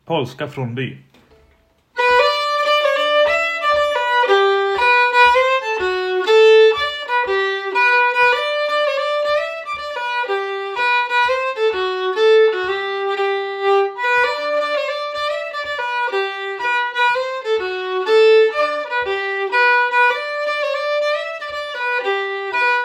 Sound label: Polska